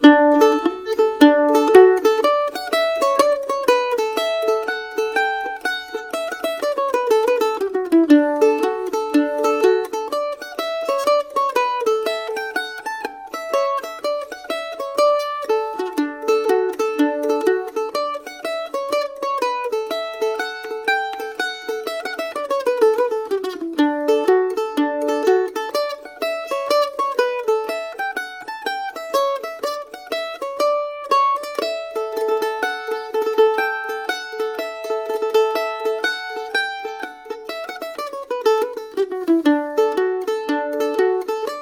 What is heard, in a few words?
hornpipe mandolin; harvest home hornpipe; tune tutor